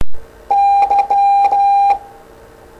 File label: Morse Y